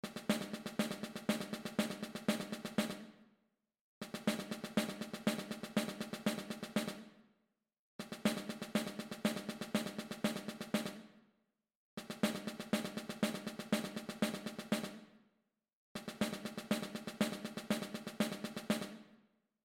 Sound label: snare